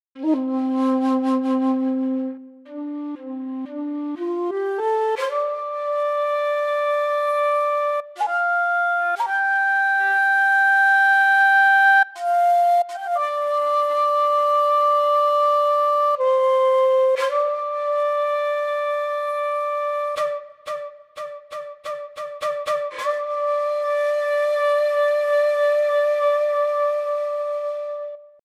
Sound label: Shakuhachi solo